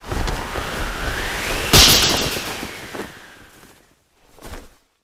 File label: breakdown; deplume